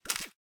skeleton hurt, monsters

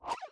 ladyskel miss, monsters

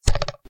bow shoot; weapons

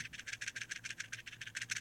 spider die, monsters

